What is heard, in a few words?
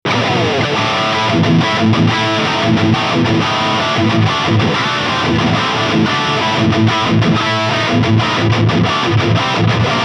High Gain; Solar Guitars A16D